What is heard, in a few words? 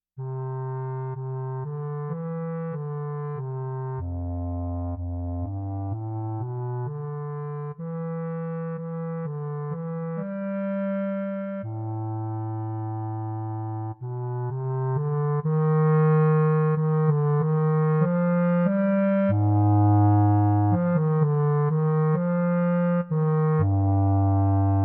Contra Clarinet Lyrical